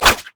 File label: basic attacks; Zyra